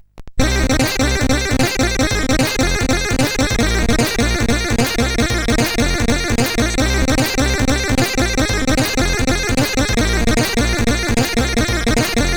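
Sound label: MOS CSG8580R5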